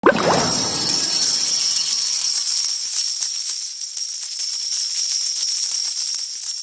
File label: music big prize result